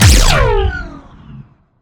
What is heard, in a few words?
Sciweap Shot Large Laser Astral Disintegrator